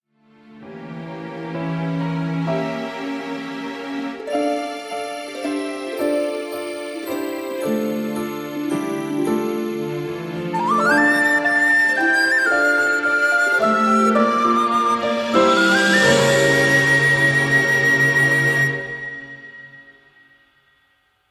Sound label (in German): Zwischenmusik Playback